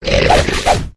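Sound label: lizard attack bottom; yasher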